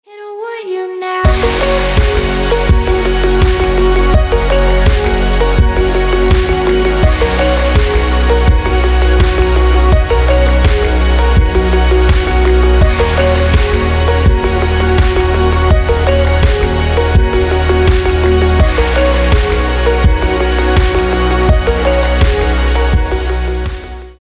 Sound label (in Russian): Красивый future bass